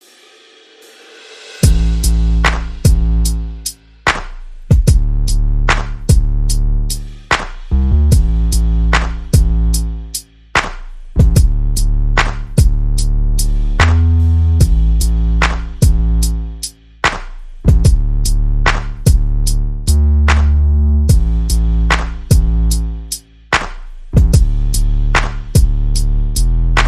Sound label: Bass and Perc